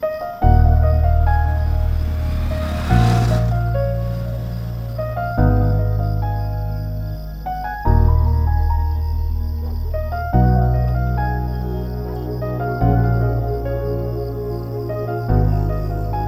(Piano); Maari 2; Tamil

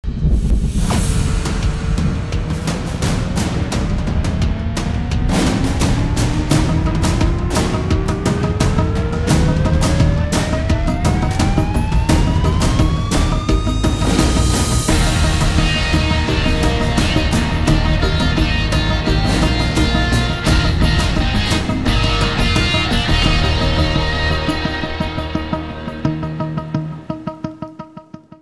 Electric Guitar Version; Kaatru Veliyidai; Tamil

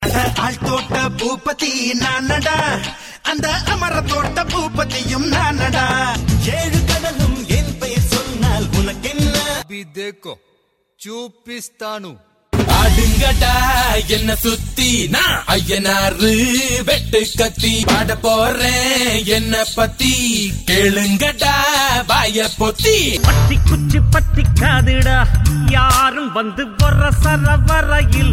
Ringtone; Vijay Vs Ajith; Tamil Ringtones; 2009 Tamil Hit Ringtones

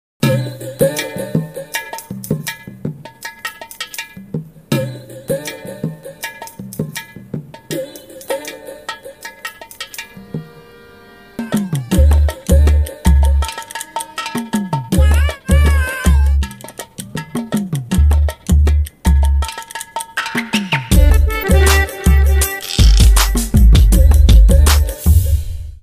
Learning To Plays Piano; Thaandavam; Tamil

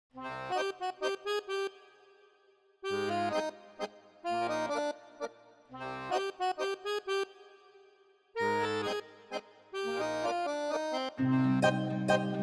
Accordion, Background Score; Sillu Karuppatti; Tamil

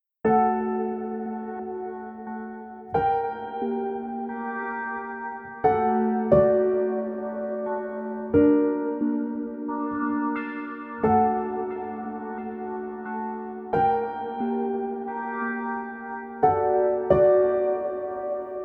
Background Score; Bhoomi; Tamil

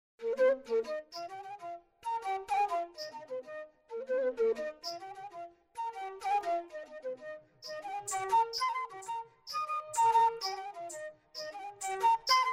Title Music Acapella; Kaaviya Thalaivan; Tamil

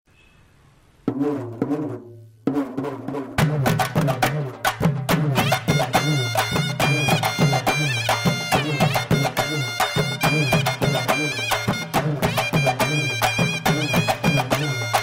new theme music Ringtone, Tamil Ringtones; 2009 Tamil Hit Ringtones

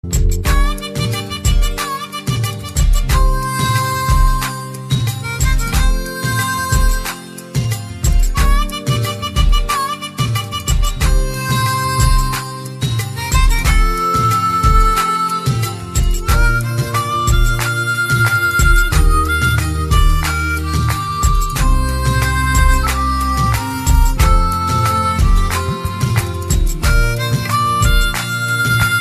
Ringtone, Tamil Ringtones; 2009 Tamil Hit Ringtones

